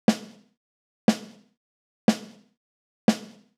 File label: Snare Rock; hydrogen